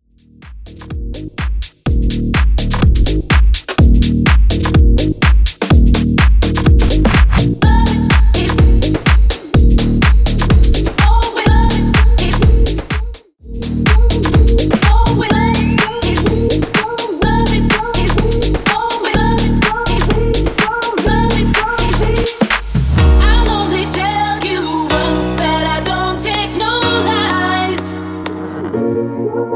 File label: House Clean 125bpm